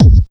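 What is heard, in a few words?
125 drum 2 R, Fresh Disco House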